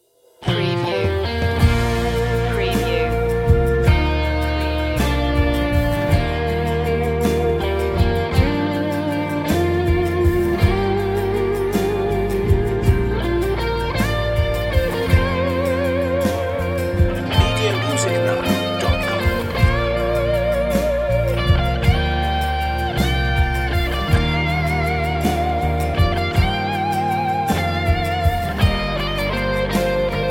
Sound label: instrumental